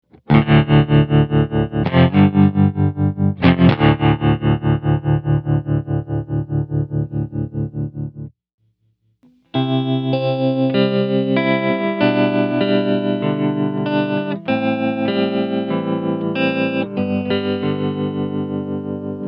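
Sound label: tube, Strymon Flint, Guitars; Tube Intensity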